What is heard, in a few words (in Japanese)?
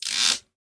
きしむ床を歩く足音